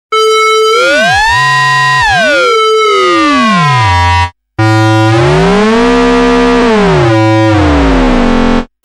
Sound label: ringmod